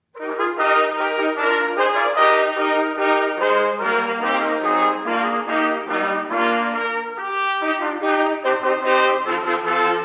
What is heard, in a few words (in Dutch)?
Trompet Ensemble; Solistenconcours